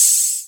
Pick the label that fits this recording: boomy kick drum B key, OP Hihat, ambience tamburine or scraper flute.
OP Hihat